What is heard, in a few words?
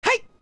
Whip; Adult Link; Link whipping Epona